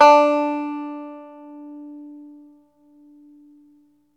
ARCHTOP, Arch Top Banjo

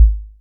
KICK, Hip Hop

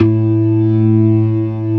TARK, organ combos

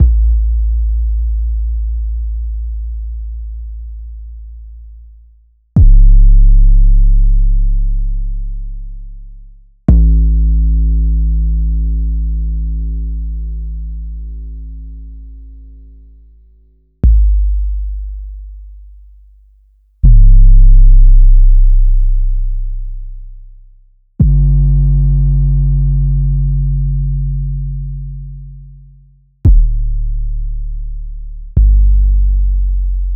808 trap 808 Bass; Sliced

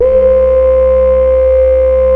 BEGIN LOOP, Synth and Organ

